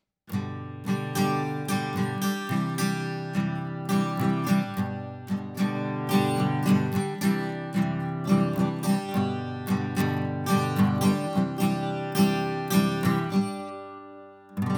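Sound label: Lewitt RAY Aura guitar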